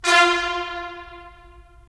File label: BRS TPS, Stacc; Roland - LA Composer vol. 4